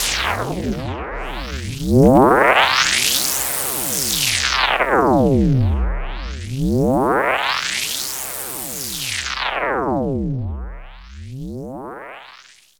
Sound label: NOISE STER, SYNTH FX; AMG; Global Trance Mission VOL-2